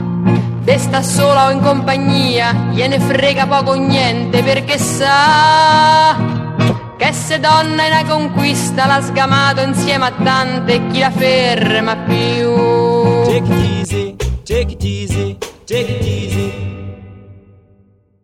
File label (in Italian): tango